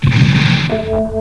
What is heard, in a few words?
hit boom